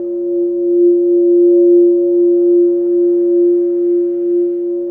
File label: FX Feedback Sus Loop